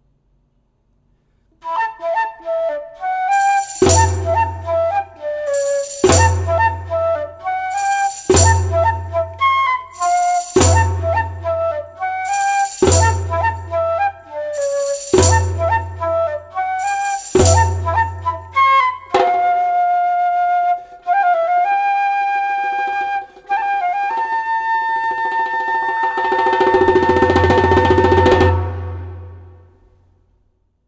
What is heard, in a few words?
Flute Dance